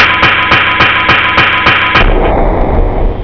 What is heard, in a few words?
DRUMS